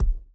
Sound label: Ball Player Hand Hit Tackleball Extra Light